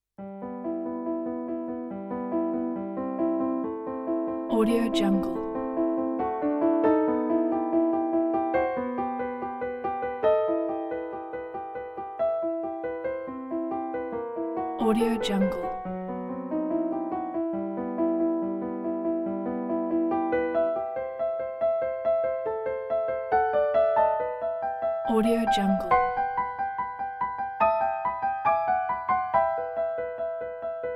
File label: light and darkness for piano solo